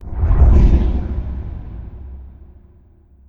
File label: debris; hl2dm; oppressive territory